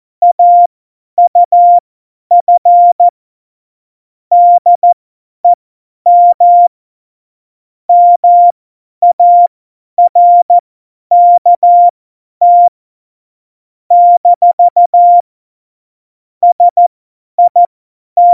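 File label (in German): Witz, Tempo 50, Morsekurs, cw morsen; Witze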